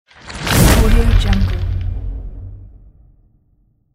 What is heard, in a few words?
ice cracking whoosh hit